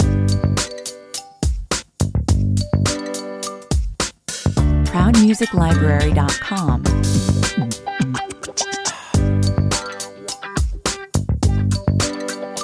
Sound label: ingame loop friendly 105 bpm